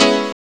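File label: piano chord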